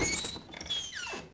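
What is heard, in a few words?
door wood squeak; Max Payne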